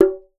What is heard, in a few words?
AFRICAN, African percussion; Voice Crystal - Steve Reid Definitive Percussion